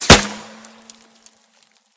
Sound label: dirt, bullet collide; dirt02gr; Anomaly Bullet Cracks and Impacts Redone